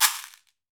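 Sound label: Latin Shakers, caxixi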